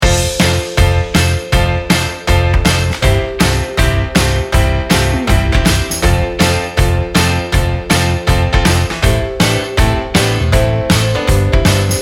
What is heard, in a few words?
sunny in motown loop